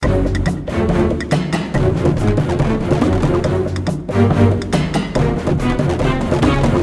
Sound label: Action Loop; Chrome